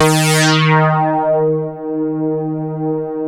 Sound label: LEADS; Masterbits; Vintage Voltage